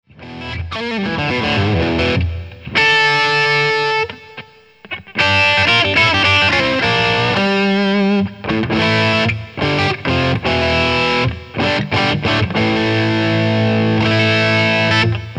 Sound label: Overdrive, Cyber Deluxe